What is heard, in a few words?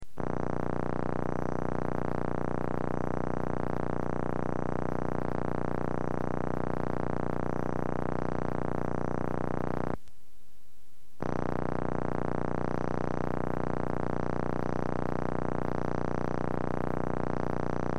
Pulsar Crab Integrated